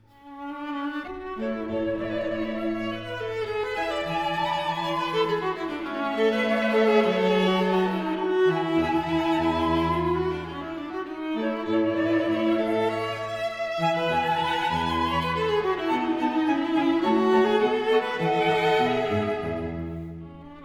flute, String Quartet; in A, K.298; Variation III; Mozart Flute Quartet

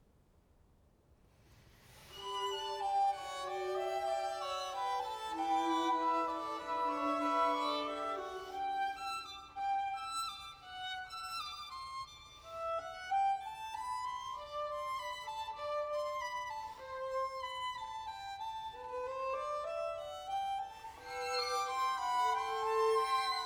String Quartet; I Calmo, con tenerezza e simplicita

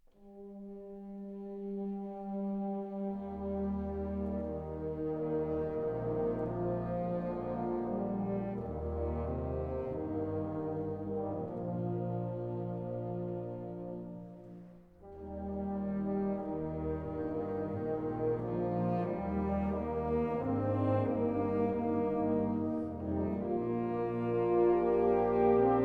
Wind Orchestra; Grainger Edition Vol 4; Colonial Song

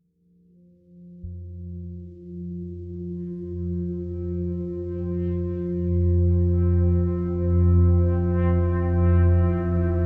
electronic music; Mr. Robot, Vol. 7

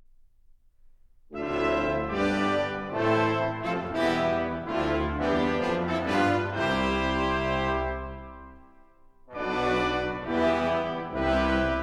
Wind Orchestra; Grainger Edition Vol 4; Lincolnshire Posy V. Lord Melbourne

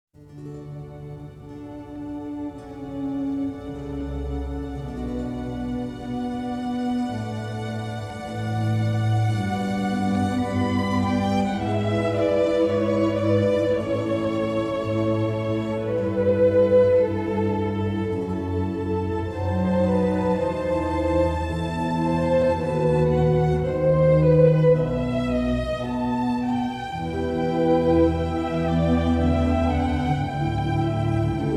Orchestra; Bach Suite 3 - Air for G String

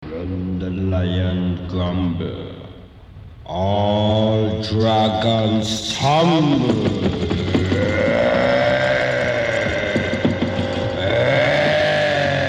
jingle; lion

